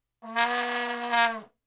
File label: vuvuzela